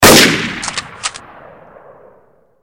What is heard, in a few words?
scout fire